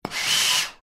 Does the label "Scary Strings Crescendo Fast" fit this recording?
No